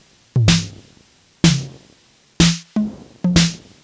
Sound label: drum loop electro percussion 125, Classic Chicago House; Sample Magic; Drum Loops